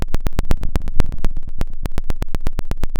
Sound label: pop, Sound of a fire crackling